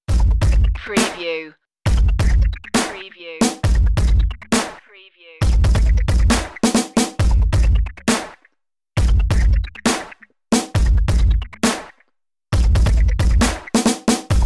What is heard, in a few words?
street fight dnb